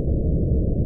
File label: space; clanlib; NetObjects